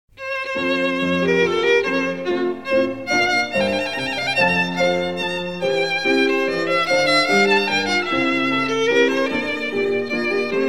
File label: Violin; Suzuki Violin Method; Courante, A Corelli